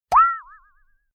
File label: Hand Saw Hit And Metal Wobble Impact Cartoon; Pitch Bend Upwards; Warped; Tom Hutchings